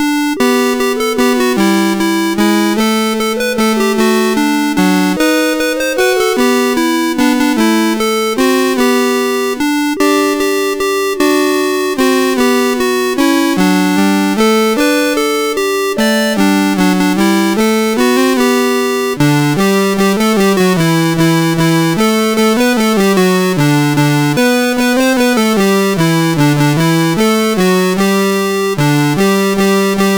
We wish you a Merry Christmas, CSG8580R5

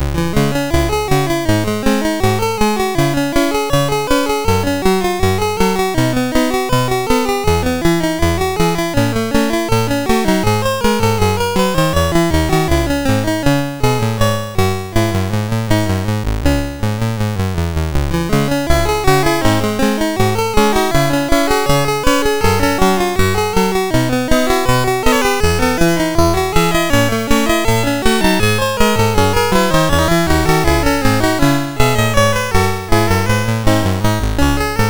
MOS6581R2, sid; Rupert and the Ice Castle

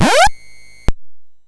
Brrr, MOS6581R2

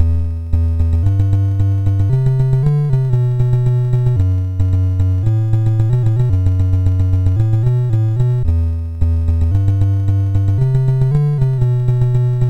MOS6581R4, sid; Nibbler; hvsc

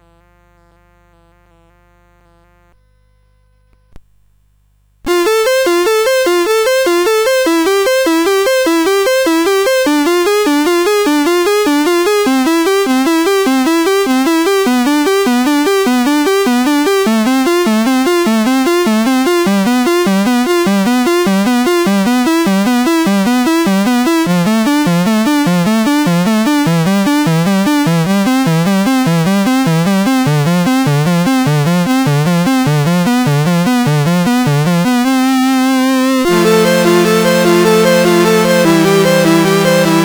Air for Violin and Two Cellos in C Major; CSG8580R5

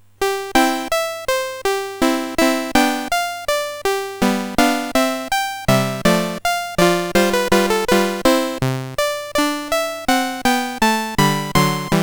Sonatina, CSG8580R5; Mozart Sonatina No1

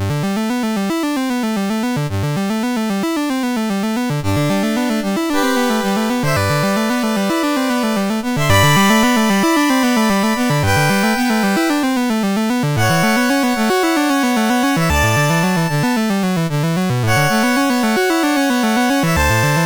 Prelude in G Major, MOS6581R2; Op28 No3

